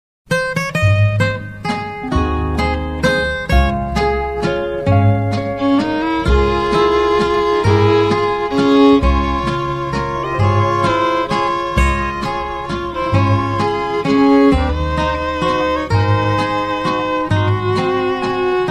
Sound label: OVER THE WAVES instrumental, Old Country Music